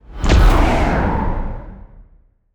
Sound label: BOSS Appearance